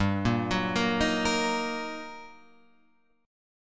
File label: G Minor Major 7th Sharp 5th, G, down, Guitar, Open F; GmM7#5 arpeggiated; JGuitar